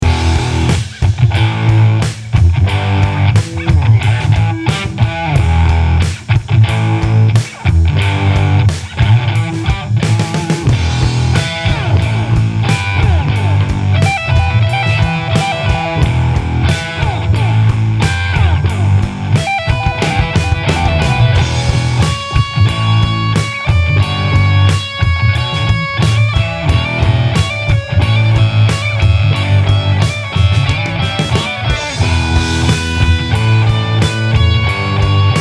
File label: tiny metal